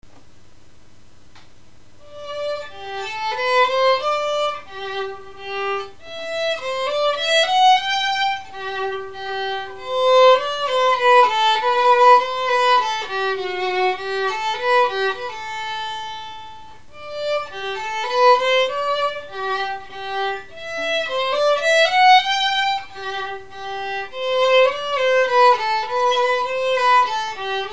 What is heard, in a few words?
Cannon, violins